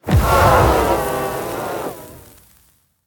Ghost Death; The Town

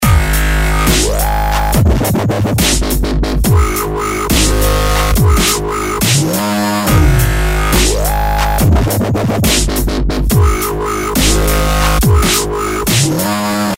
Dubstep; Loopport

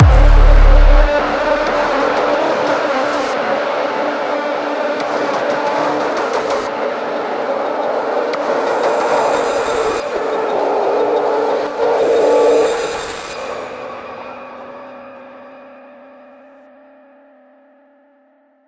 Main Ambient; Blood Harvester

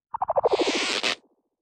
roped; En whipper

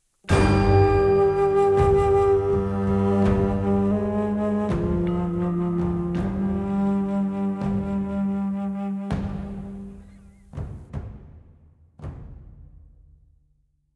Music Battle

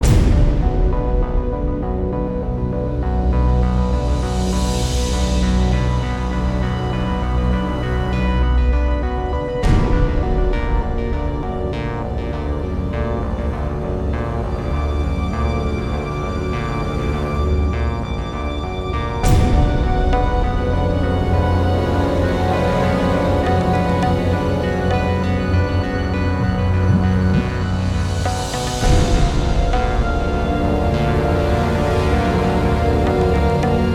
Front End Ambient; Strike Suit Zero

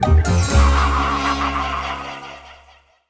Fanfare Lose; mobile; mixels rush

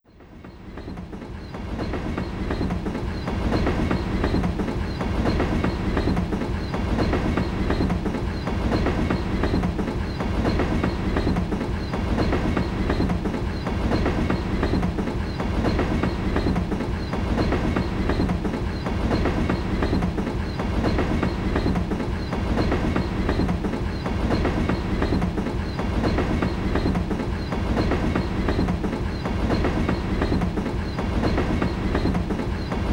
Train; paper mario 2 the thousand year door

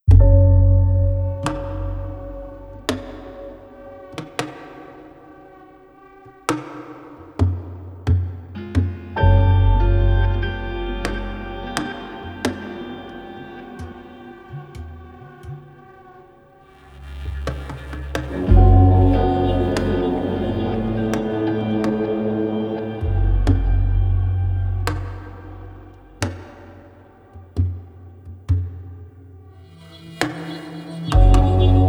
Animal Horror; This Is the Police 2

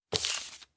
Objective Off

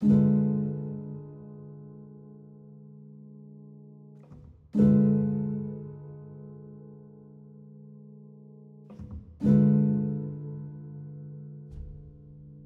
oneshot 102bpm; theme confrontation part04

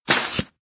Skyhook Launch; Doodle Jump 2; Android

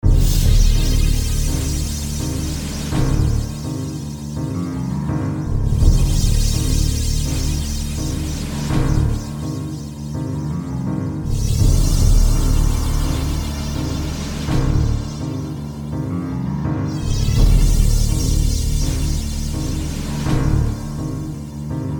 Alaska - Ambience

